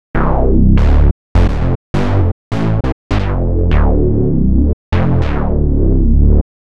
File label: bass; fabfilter; twin2; jsbass